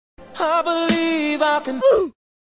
off sound

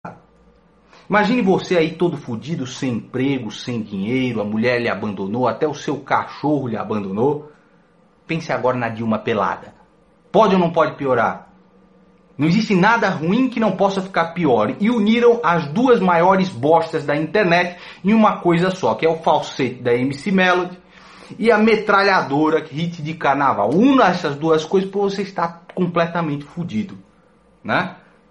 ringtone; podia ser pior

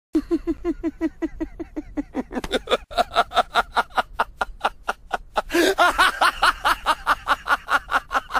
laugh; Gilgamesh

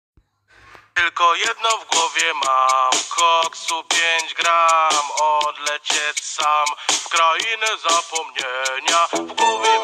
polish cow dance meme

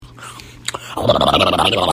goofy burp

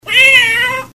meow

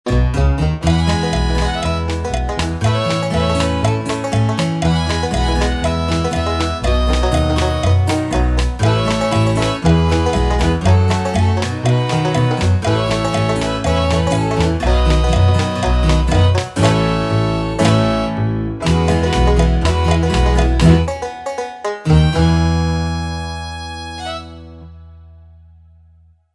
Instrumental

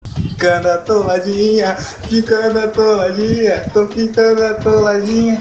game over

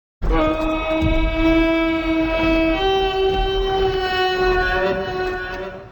horn, army; haradrim

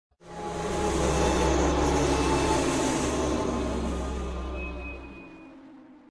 ominous; scp 173

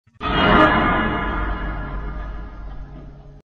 animatronic at door; Fnaf 1

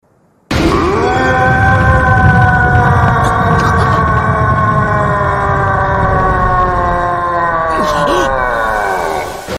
screaming

